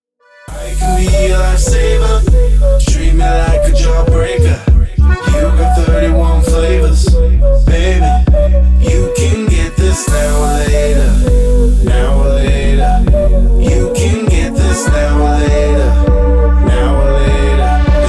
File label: funk; powermusic